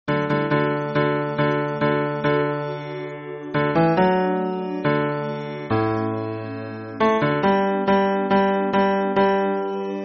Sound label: hymn bass